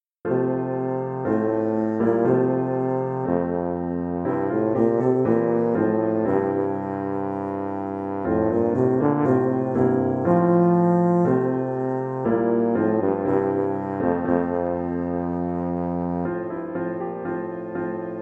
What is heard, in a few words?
tuba